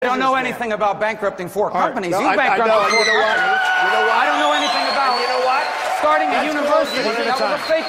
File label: screamer; No Agenda